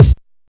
kick single shot C key, bass drum sound tuned to the C note. Loudest frequency: 253Hz